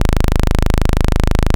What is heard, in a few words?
Saw A0, Synths; Multisamples; Saw SH; Ableton Live 12 Lite; Core Library